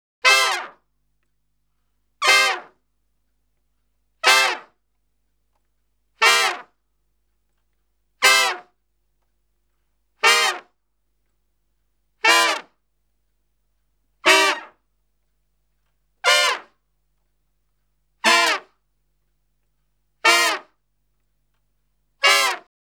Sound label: Salsa Picante; Masterbits; World Zone VOL-3